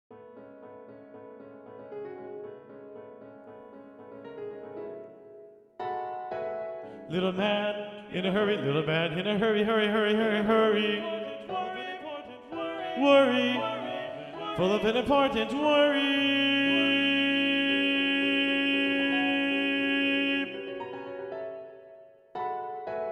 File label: TTBB Bass 2 Predominant; Mens